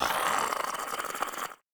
idle, zombie; DayZ Epoch

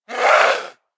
chase, zombie; DayZ Epoch

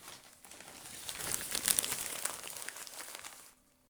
open doc; document opening sound; DayZ Epoch; effects

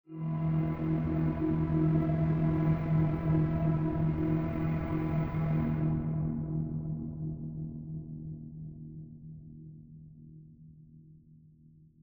suspense; DayZ Epoch

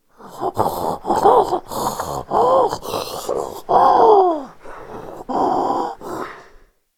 idle, zombie; DayZ Epoch

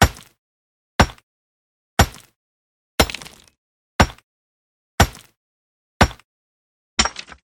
mine stone; DayZ Epoch; effects

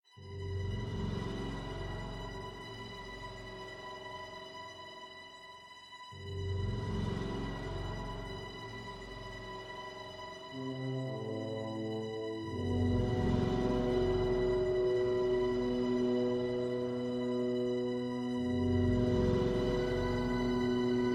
suspense; DayZ Epoch

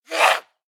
attack, zombie; DayZ Epoch

